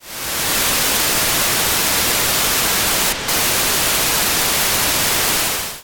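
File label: PHOENIX, satellite; AIRSPY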